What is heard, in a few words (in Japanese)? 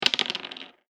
大きいダブルダイス